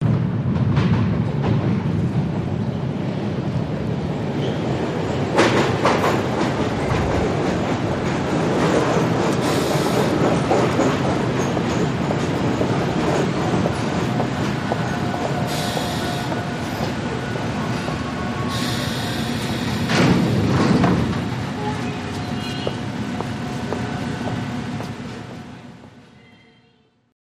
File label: Subway Arrives Stati